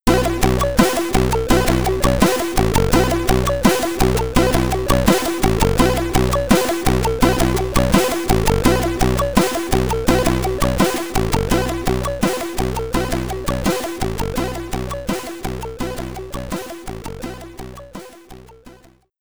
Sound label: Bonus Screen (SID Stereo); Smash TV; Commodore 64